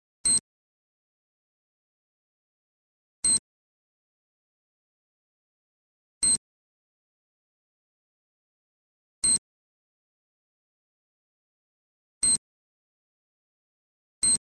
c4 beep

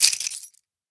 throw, m249

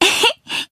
ehe

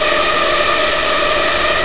tubecritter buzz

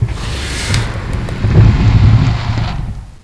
door move

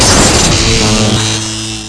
psp blast, weapons

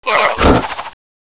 fire; sven; qk fire

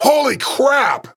die; sven; ssam

